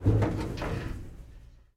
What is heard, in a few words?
Pilon Servo Start